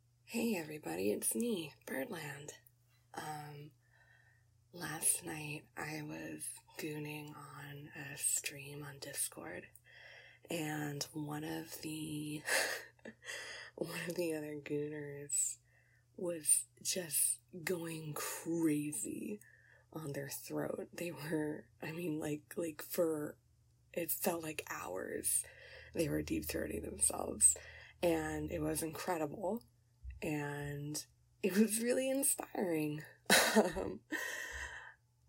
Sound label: Deep Throat Practice Throat noises Talking in the beginning Kissing; Throat noises start at 155; Licking; Slurping; Sucking; “Gluck gluck gluck” noises; Gagging; Choking; F4A